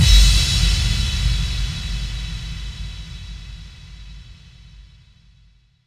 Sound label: Bassdrums FX, FX Kicks; Vengeance Samples